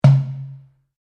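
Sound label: Drum Darabuka Palm Hit mezzo forte, Egyptian Darabuka Drum; Commanly available Egyptian Darabuka Drum; Musical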